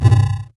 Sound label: Aura Hide